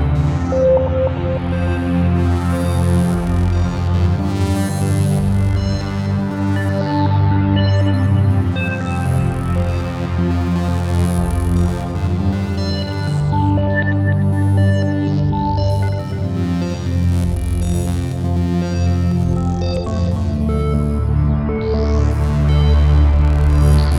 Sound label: Circuit Punk Game Menu; sci-fi